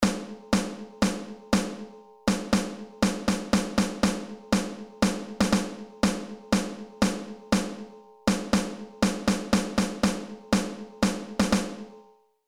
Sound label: Rhythms Snare Drum